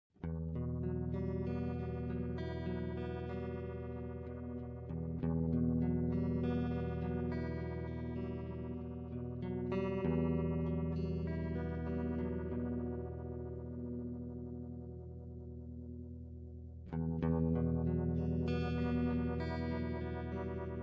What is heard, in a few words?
stereo phasor sine